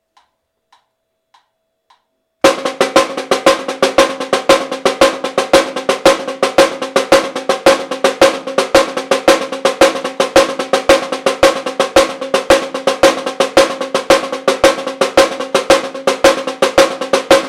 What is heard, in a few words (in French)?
batucada caixas caixa 100 bpm peu de variations